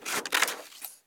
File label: Cuting Pic